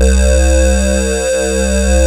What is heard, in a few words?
PULSE, Synthsonic; Pro-Rec - Synthsonic; BASSES